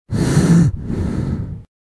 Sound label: gasss; metrocraft